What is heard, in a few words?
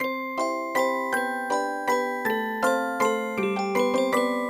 Bgm ui mini luckybox; Cookie Run